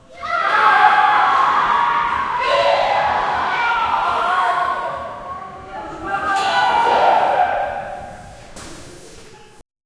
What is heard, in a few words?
echoing indoor swimming p